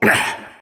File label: Grunt Hit; paladins; Dredge